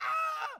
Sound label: man yell; angband